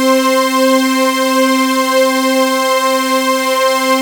RAW SAW; MKS

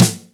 Deep & Dry, Yamaha DTXpress Acoustic Snare

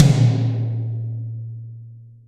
toms, Real Mega Drums; Best Service; Real Mega Drums VOL-1

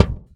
Rumble, Kicks; Airwindows

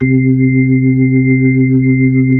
B-3 Chorus

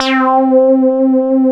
SYN42 C3; CMP - Analogon

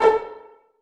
STR PIZZ A2 MF; Sound On Sound - Peter Siedleczek's Orchestra

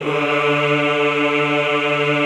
EHH D2, Classical Choir; Best Service

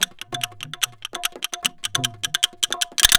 DHOLAK, Indian Traditions; USB Soundscan vol.45 - Indian Traditions; AKAI

